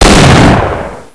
dbarrel

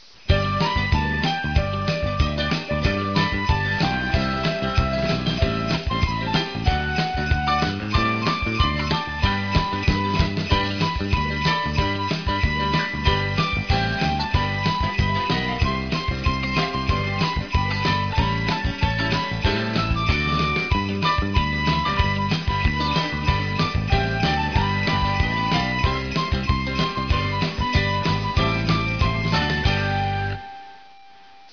jinglebells loop

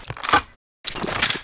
xbow reload; z-lapik ru

